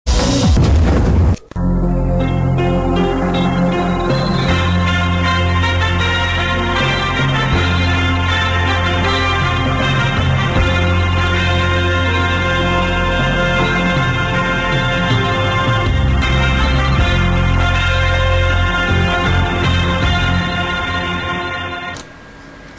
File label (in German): Jericho Trompetenspiel